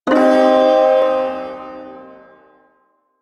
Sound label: Shawzin Min Chord; Warframe; Duviri Ersatz Shawzin